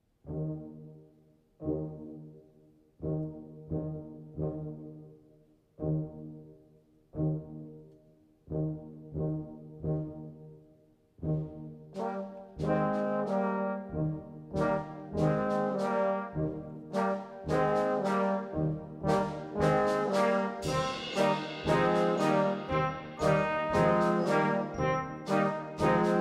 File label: Band; The Gospel Train